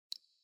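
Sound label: dew sounds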